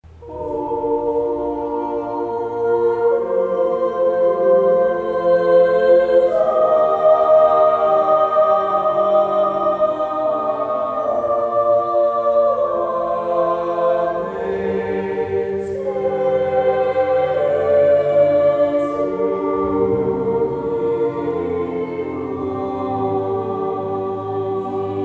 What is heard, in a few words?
polyphony; O Crux Ave